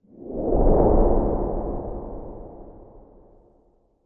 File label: Luminol Appear